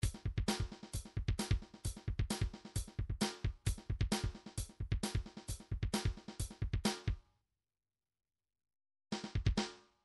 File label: drums